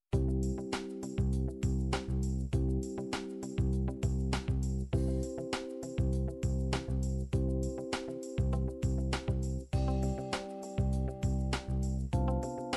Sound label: 16 beat, midi